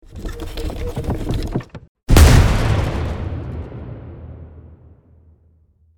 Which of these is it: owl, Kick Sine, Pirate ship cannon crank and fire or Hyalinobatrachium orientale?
Pirate ship cannon crank and fire